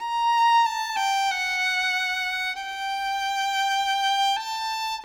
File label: Violin; Sonic Foundry (Sony Creative Software) - Orchestral VOL-2; Modern 36